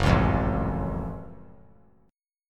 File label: F sharp Minor Major 7th; strummed; 6,8,8,7; 3C,3F,3A,3D